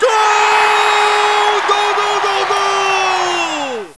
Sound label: goal